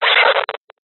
science fiction radio signal interference burst

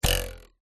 cartoon bounce jump ruler twang

